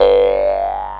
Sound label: MOUTH ARP G1; Big Fish Audio; Synth City